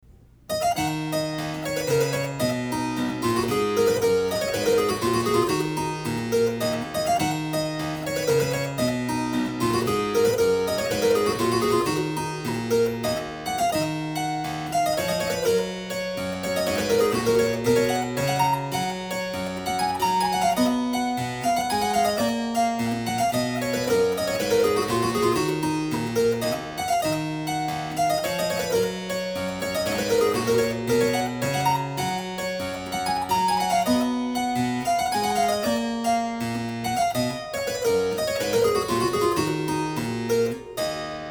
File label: Hornpipe, harp